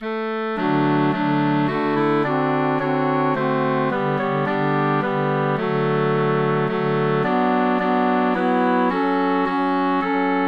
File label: Melody Harmony; Flow Gently Sweet Afton